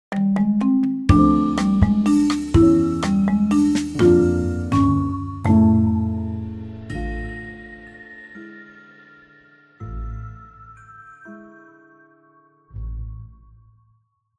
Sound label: Level Start; kill it with fire heatwave android ios ps4 switch windows xbox one gamerip 2020